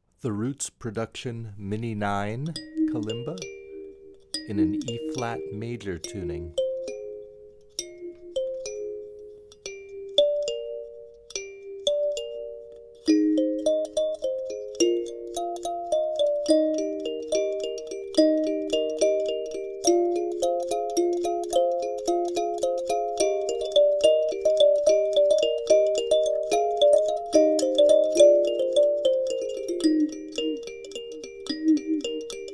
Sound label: Mini 9 Eb Maj